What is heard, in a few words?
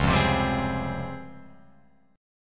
Db Major Sharp 11th down Guitar Open F; strummed; JGuitar